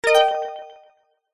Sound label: game tone generic synth delayed